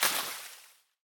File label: Lagoon Water Splash